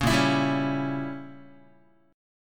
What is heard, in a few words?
Bb Diminished 7th Guitar, Bbdim7 chord; strummed; JGuitar